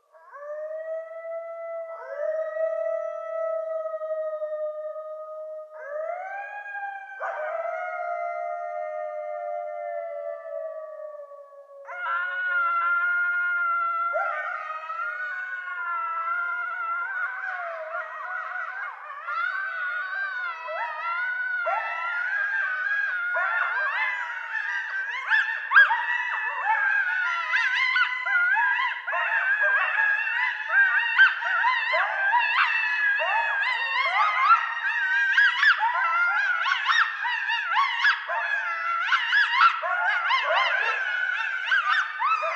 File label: Coyotes; Coyotes (Canis latrans)